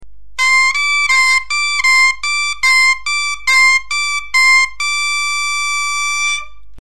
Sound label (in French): do-rй octave, bombarde; La même chose que ci-dessus mais en partant du do octave